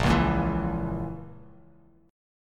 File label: Bb Diminished Bb down Bass Standard; strummed; chord shape